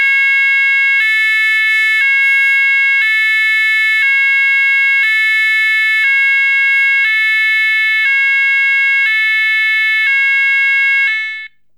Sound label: Sounder; Low/Very Low Two Tone Siren - 550Hz/440Hz; Weather Proof Sonos Electronic Sounder SS240W